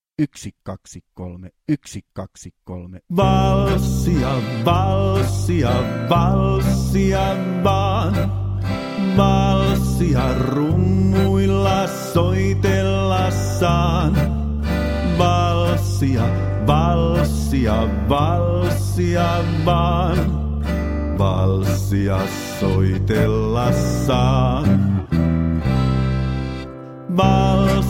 alkulaskuvalssi ilman rumpuja

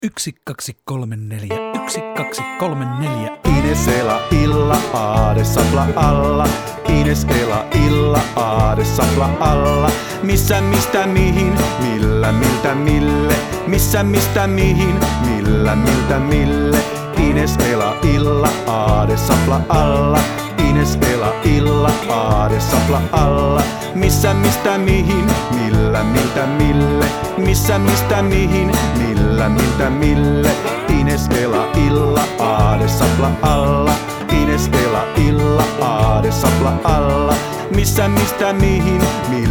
paikallissijat rummuilla; alkulasku

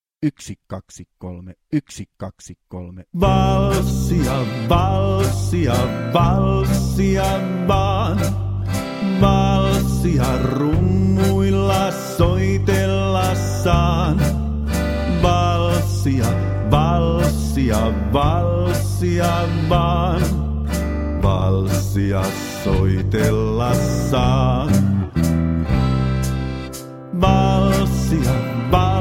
valssi rummuilla; alkulaskuvalssi

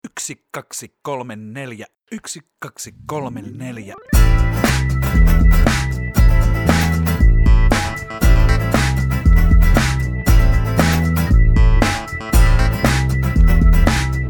rap ilman laulua; alkulasku

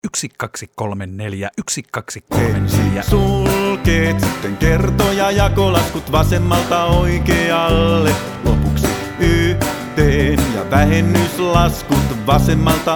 alkulasku laskujarjestys rummuilla

